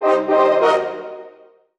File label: level up; ui; economy; underdraft